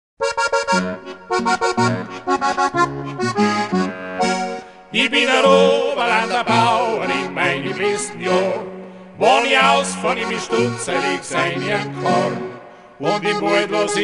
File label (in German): Volksmusik